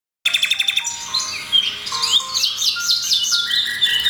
Recording, bird; Pengusir Hama Burung Otomatis

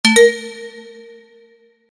error

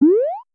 button

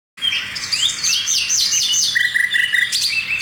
Recording, bird

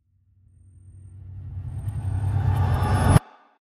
Tension Riser; Inspire Sound Effects; SoundEffectStory

